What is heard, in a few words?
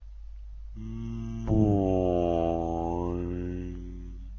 moooink